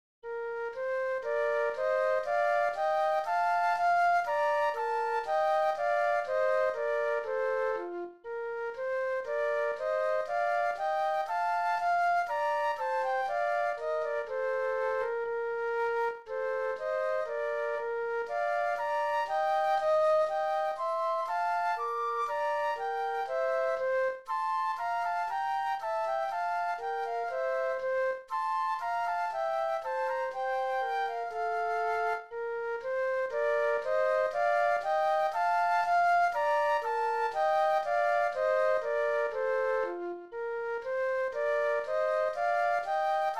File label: Gavotte Flute Duet; classical; Handel; HWV 441